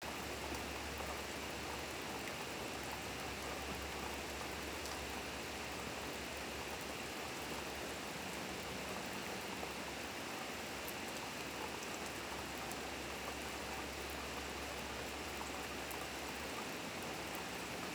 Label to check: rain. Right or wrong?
Right